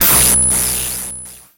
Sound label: Transition Electrical Distortion; Adobe; Production Element